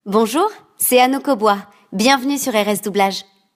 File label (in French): hautbois